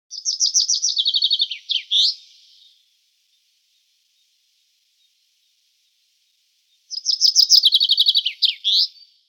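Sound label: zyablik voice, chaffinch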